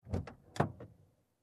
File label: Opening glovebox